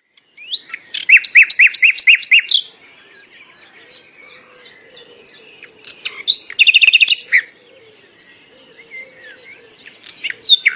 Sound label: audio nightingale